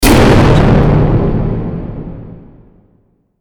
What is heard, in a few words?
epic impact hit